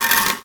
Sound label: Plank Stn Slide No Lp